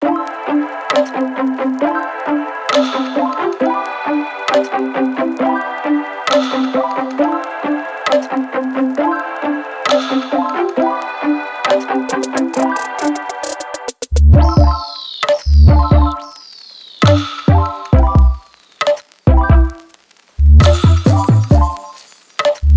future bass flip instrumental

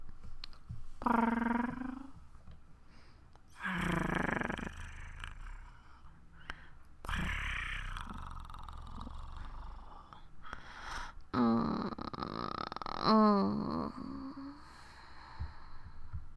purrs

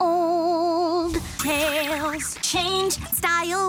vocals, 130BPM; Pony Fan Music Remix Stems

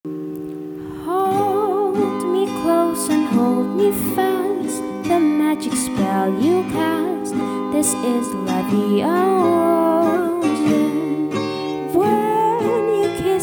Fourteen Year Old Bee Singing

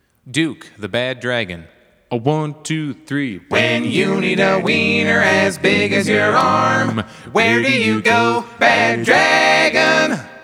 Jingle

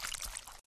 slosh; Lewd SFX

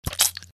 Suck; Lewd SFX

